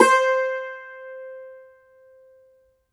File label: cumbus saz C, plucked strings